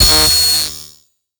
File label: Glitch, Digital Glitch; Total Khéops; Dark Atmo And Digital Glitch Vol.2